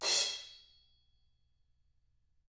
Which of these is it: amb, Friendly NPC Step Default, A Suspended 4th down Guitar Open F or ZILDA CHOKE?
ZILDA CHOKE